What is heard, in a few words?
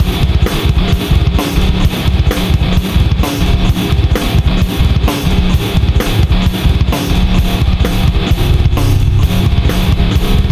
metal loop